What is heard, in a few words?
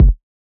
Kick